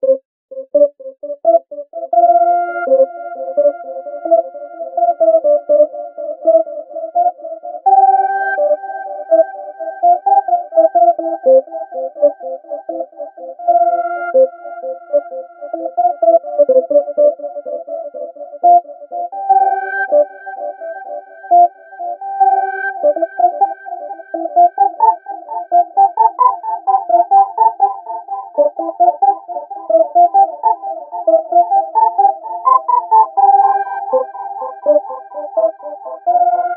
waltz; Taka no Tsume vs Hae no Hane Dan; faeris

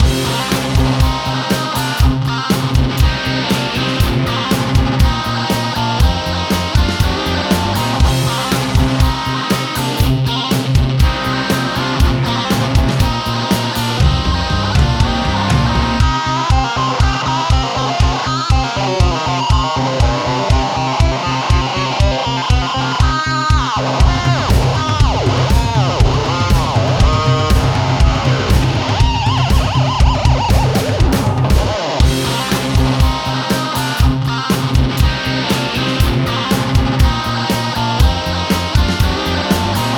music rock; Happily Ever After

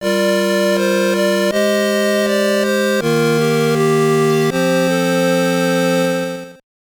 Jingle; Blue Wing Blitz; WonderSwan Color

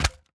wood hit

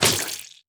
Fat Zombie Smash; gamerip

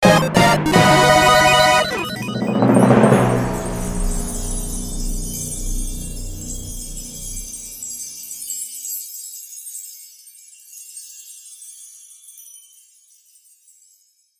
Item Get Wow; SanctuaryRPG

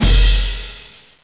Sound Cymbal

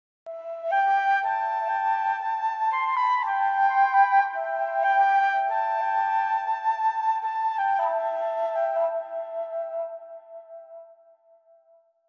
flute phrase; Walden

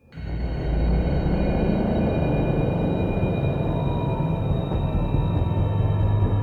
140bpm intro

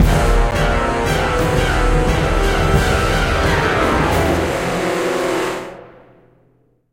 Terror Sting; Jurassic Park The Game

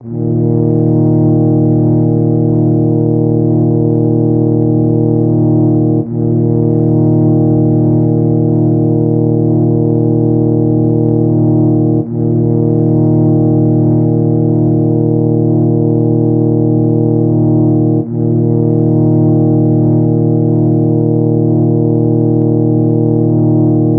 Owl Song BGM Tuba

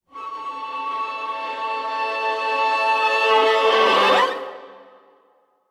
Failure Stinger

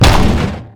Bomb Explode Sound; Jolly Battle

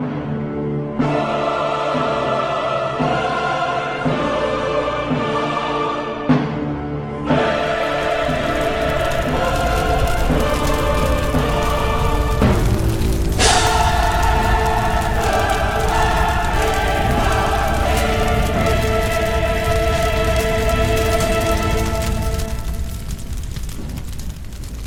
Ebm Win; Vibin' Vendetta

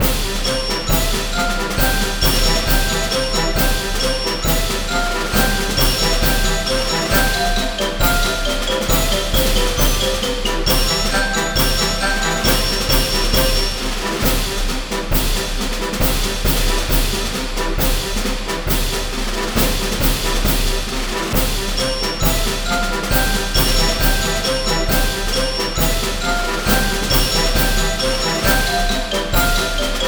Win Large; Carnival Games; wii gamerip 2007

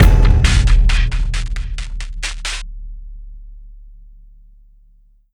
Desert Small Fight End; sky break

